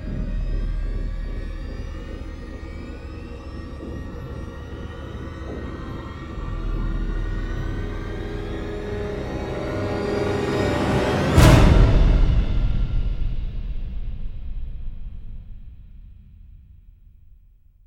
strings, sad

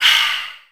breath

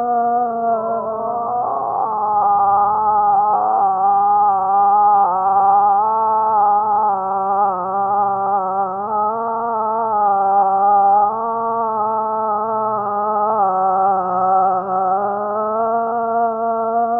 voice monk's groan; Loops; Sad

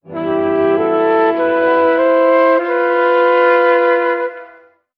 flute dragontail, Loops, Sad

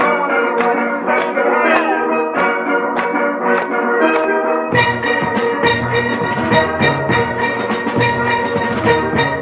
keys; Phonky

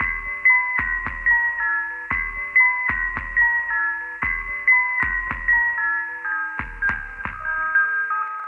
keys, Sad; loops

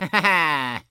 "ah ha ha"; Voice; ooh ahh

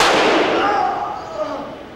gun shot groan; Crash Bang Boom

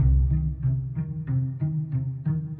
strings, Sad Loops

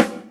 Snares Electro

